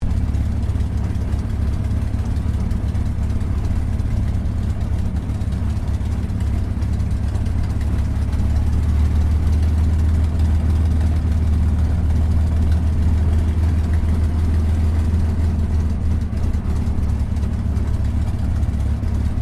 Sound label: AIRCRAFT CAC CA-18 MUSTANG MK23 PREFLIGHT INTERIOR; CA-18 /P-51 Mustang; Airplanes; Vehicles; Aircraft Collection; Twistedtracks